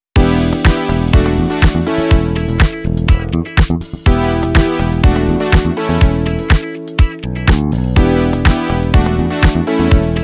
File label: house electronic techno; By Burak Sound Bank; house electronic techno vol 5